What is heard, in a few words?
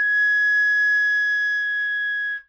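flute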